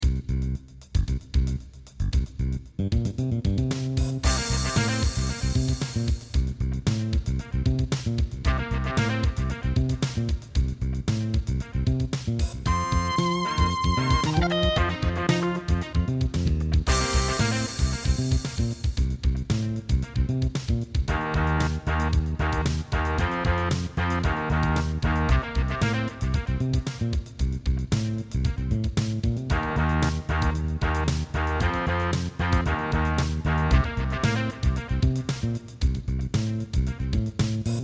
Rock

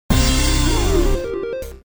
Short Win; Super Adventure Pals

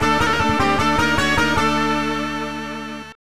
Finish Level; prince of persia snes

